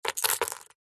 foley gemstones several small opal amethyst jade set down on surface